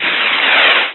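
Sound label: Firework; Buggy; PS1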